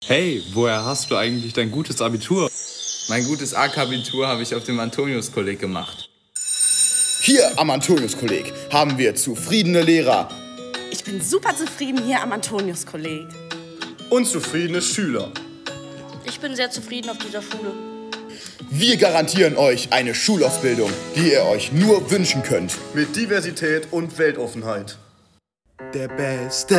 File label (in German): Musik Werbung